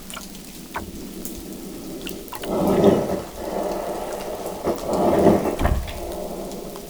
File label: roar harbor seal